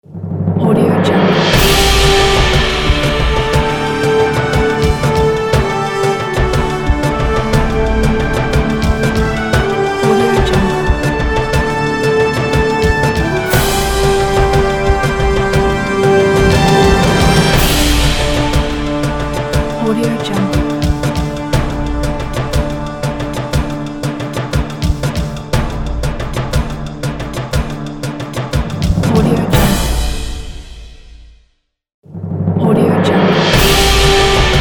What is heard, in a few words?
world news theme